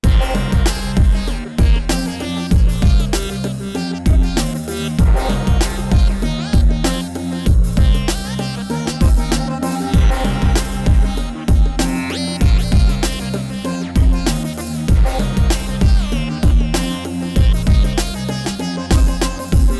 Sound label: stock tubes half